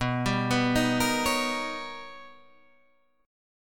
B Augmented Major 9th B Guitar Open D

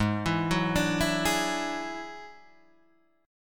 F sharp 7th Suspended 2nd Sharp 5th, G sharp

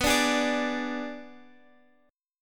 B Minor 6th B

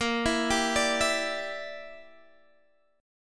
Bb 6th Flat 5th Bb; Bb6b5 arpeggiated; x,13,14,12,15,12

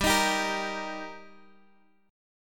G Minor Sharp 5th G down Guitar Open D

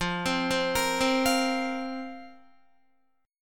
F Suspended 4th, Guitar; Fsus4; arpeggiated; 12,15,12,15,12,13

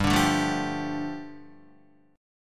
G 7th Suspended 2nd, Guitar; G7sus2 strummed

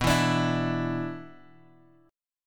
B-Minor Double Flat 5th; Bmbb5 Chord {X 2 2 X 3 0} strummed

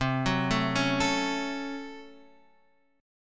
A 6th C, A6/C chord; arpeggiated

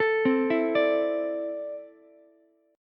C 6th Add 9th C down Ukulele; arpeggiated; JGuitar

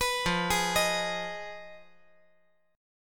F Minor 7th Flat 5th, chord

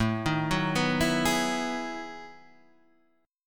B 13th A, chord

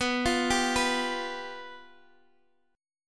F Suspended 2nd Flat 5th, B

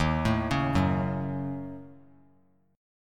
D sharp Minor 7th Flat 5th D sharp, down; D#m7b5 arpeggiated; 3C,3F,3A,3D

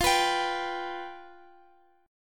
F Suspended 2nd Flat 5th, F, Guitar; Fsus2b5 strummed; 4A,4E,5A,5D,5G#,6C#

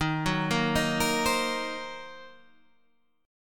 Gb 6th Flat 5th Eb

